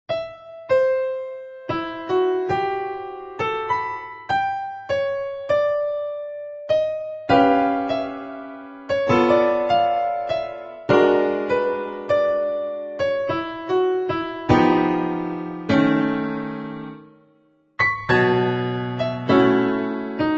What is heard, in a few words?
piano solo, piano